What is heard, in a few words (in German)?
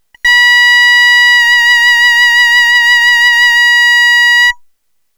geige Hoch Mit Vibrato, Violin; Physical Modeling of Musical Instruments